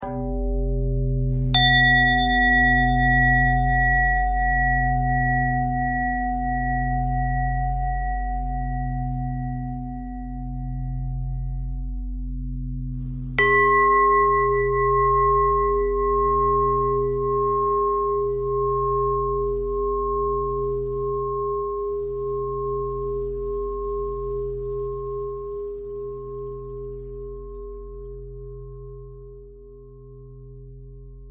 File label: bell thread pentatonic